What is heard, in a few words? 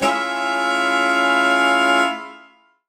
Horn Swell Alt F min6 maj7; undercover samples